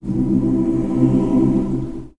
"doir", Voice

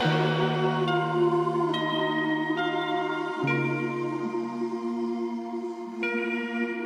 keys teardrops, sad loops

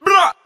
brrrrrr, Voice

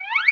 whistle up

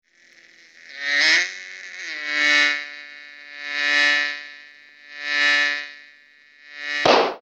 fly; Animals

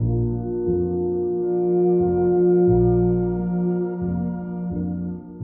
keys glassier

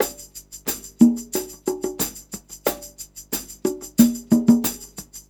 buzzy, drum loops

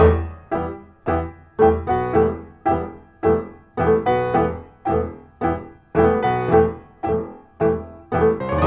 piano, loops, phonky

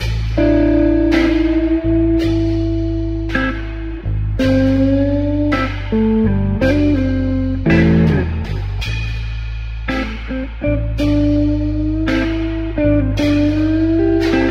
guitar bluesy swang, Loops, Sad

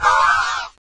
growl

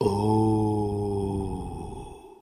"ooh", voice

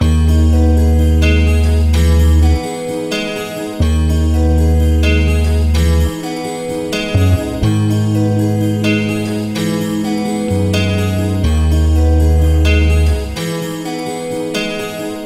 guitar sadness with heavy bass, Loops

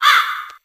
crow; Animals

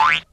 boing

